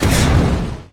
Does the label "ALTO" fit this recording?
No